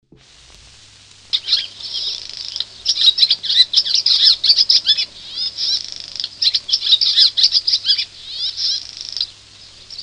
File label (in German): Rauchschwalbe; Vogel